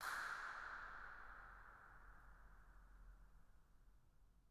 incinerator dirac

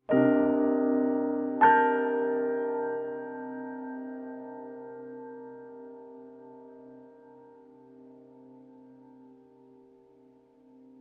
tune

